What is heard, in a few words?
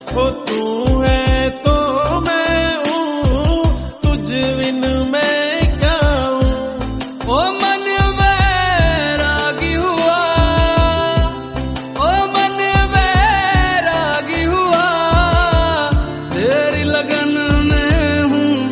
Bhakti Song